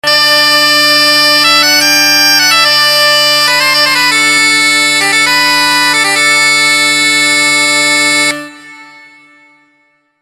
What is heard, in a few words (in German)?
dudelsack